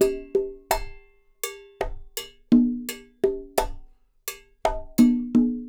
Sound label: Percussionworks; Sampleheads; NYC Percussionworks; 90 s Sample CDs